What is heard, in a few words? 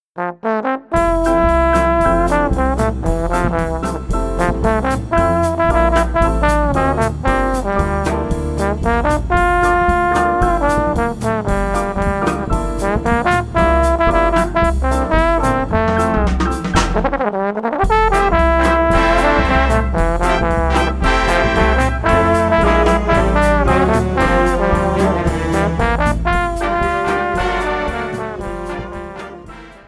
Samba